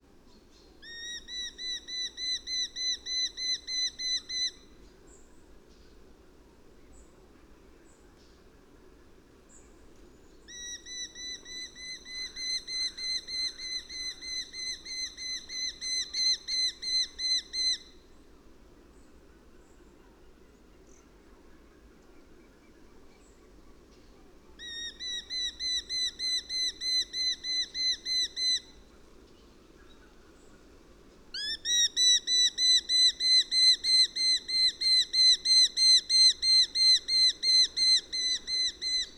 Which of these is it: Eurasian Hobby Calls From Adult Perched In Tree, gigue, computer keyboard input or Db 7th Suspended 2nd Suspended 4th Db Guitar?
Eurasian Hobby Calls From Adult Perched In Tree